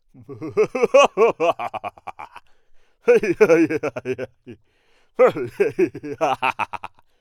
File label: Evil Amused Male Laughters